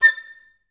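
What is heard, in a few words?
flute staccato A3; Best Service; Peter Siedlaczek's Advanced Orchestra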